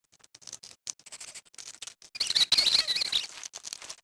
Rat Idle; characters

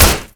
Sweetner Metal, impacts; Totaled; XBOX

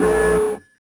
alarm; Soldier Of Fortune II Double Helix; XBOX; ambience

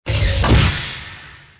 lift; Soldier Of Fortune II Double Helix; XBOX

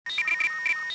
fuelpump; ambience; Star Wars Jedi Knight II Jedi Outcast; XBOX

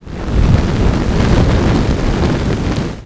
fire

